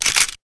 take photo, camera; sven; cryoffear; weapons

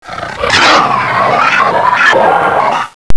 melee; gonome

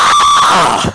scream

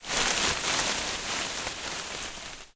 grass

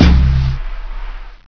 button

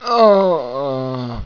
die

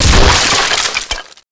bust crate

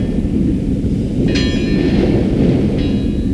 harbor wind

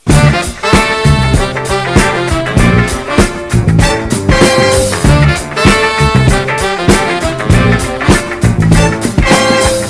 funky

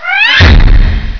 attack

